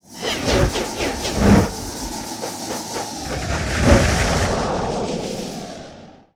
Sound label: Whoosh Synth Composite, Horror; Sound Design